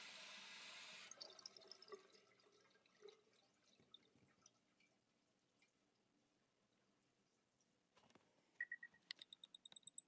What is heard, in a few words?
toilet water and feedback